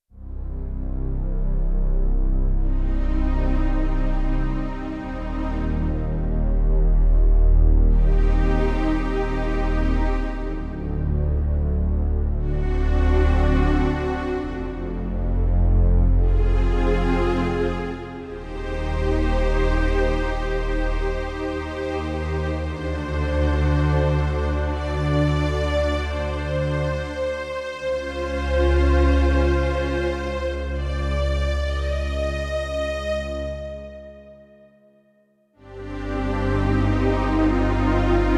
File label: Slow n Low, Roland D-70; Factory Performances